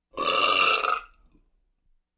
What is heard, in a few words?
Heavy Burp